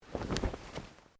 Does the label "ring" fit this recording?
No